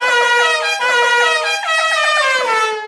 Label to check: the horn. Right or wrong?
Right